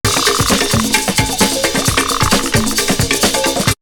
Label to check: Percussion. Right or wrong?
Right